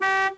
horn, car; Cars; XBOX

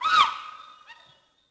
scream, creatures; Star Wars Obi-Wan; XBOX

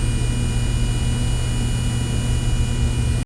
Electric Hum Whine; ambient

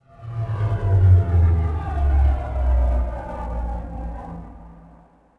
flyby distant, vehicles

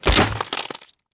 deadfall stone, foley

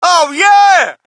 crowd, shout; Mike Tyson Heavyweight Boxing

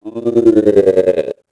ithorian die; creatures; Star Wars Obi-Wan; XBOX

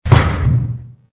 airlock open, door; Soldier Of Fortune II Double Helix; XBOX; doors

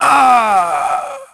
death; SOLOMON; Pulse Racer

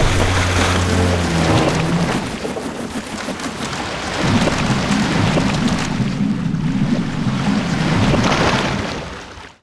ship destruct; Sid Meiers Pirates; XBOX

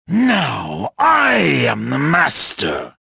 victory; reborn

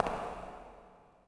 footsteps echo

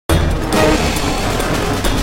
Fight Lose; Silas; The Da Vinci Code; XBOX

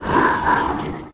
moan; The Elder Scrolls III Morrowind GOTY; XBOX

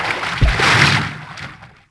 Water Step

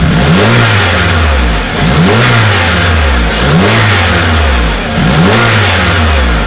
car idle revving; garage; Max Payne

